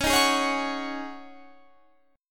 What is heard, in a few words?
C sharp Suspended 2nd Suspended 4th, Guitar, Standard; strummed; 21,18,18,18,19,21